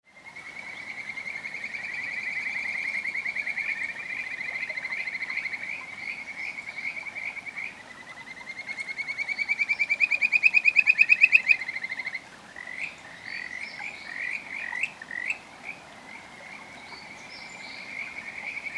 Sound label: Kajika Frog